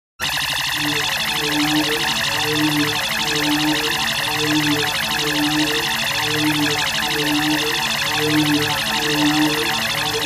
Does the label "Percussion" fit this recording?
No